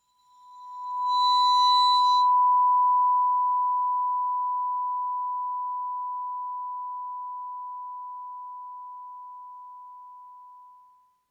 Vibraphone bow B5 stereo; MIS Pitches - 2014; Percussion

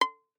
Violin pizz ff sul E Eb6; MIS Pitches - 2014; Strings

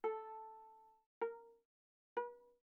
Viola pizz sul A mf A4 B4

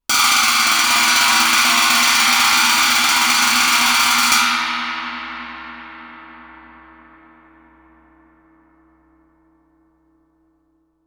chinese stick roll, Suspended Cymbals; Percussion

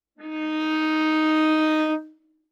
Viola arco ff sul C Eb4 stereo; MIS Pitches - 2014; Strings

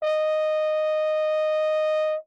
Horn ff Eb5 stereo; Brass; MIS Pitches - 2014

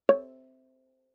Viola pizz ff sul G Db5; MIS Pitches - 2014; Strings

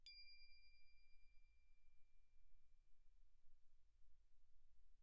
crotale D7 pp; Percussion; crotales

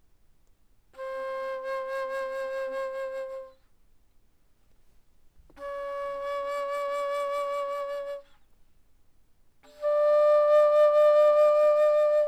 Bass Flute mf C5 Bb5, woodwinds

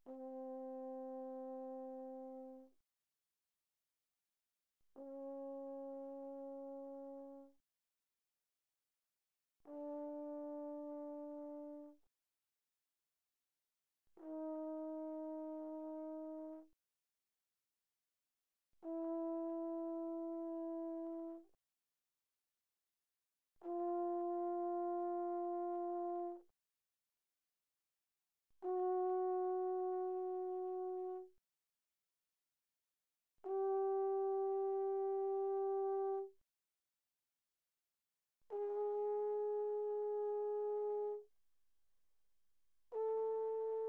Bass Trombone, pp, C4 Bb4; Brass

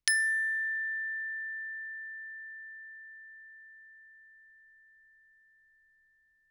bells plastic ff A6; Percussion

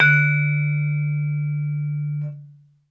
Vibraphone short sustain ff D3 stereo; MIS Pitches - 2014; Percussion

